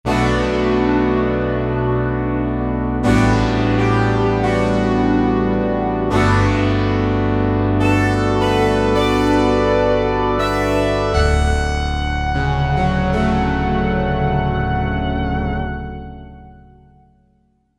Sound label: Swell-Pad; Korg MSC-1S Variety